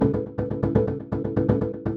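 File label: 122 BPM Sequence A major F minor Tonic C; innovative sequences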